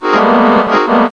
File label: ACCOR